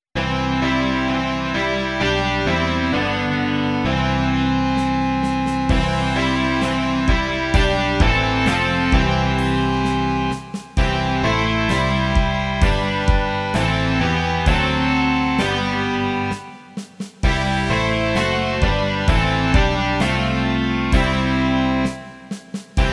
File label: rock